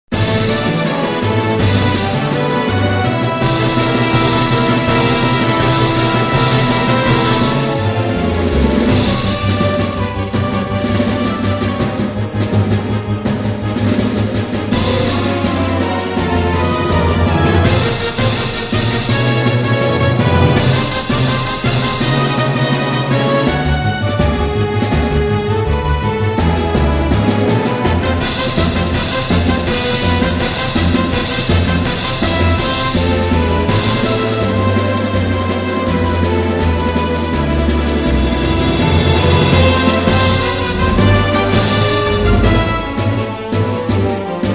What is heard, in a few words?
Orchestral Mix; Save Them